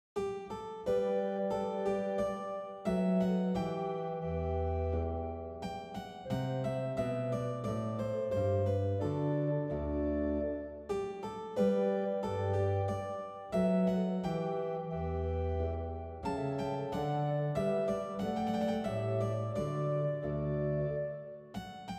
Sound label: Gavotte, Neupert Clavichord as Accompaniment to Organteq; Handel